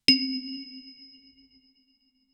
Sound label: wooden creak